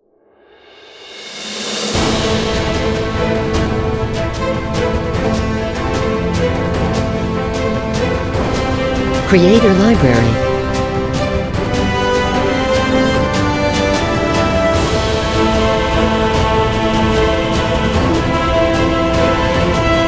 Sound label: Epic, cinematic music